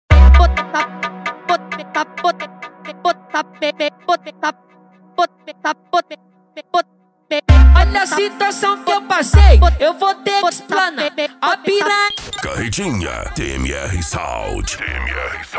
esp insane sound

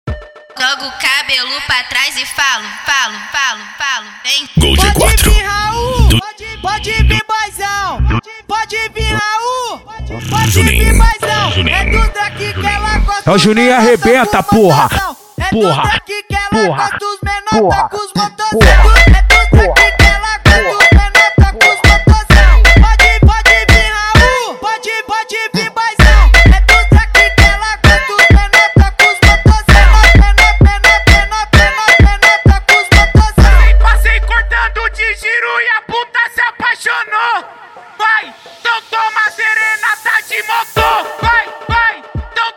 cd funk automotivo

